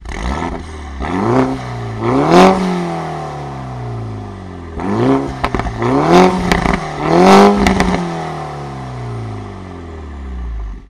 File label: Mini JCW 2,0l 170kW Open